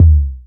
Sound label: Kick; Street Anthems Sound Kit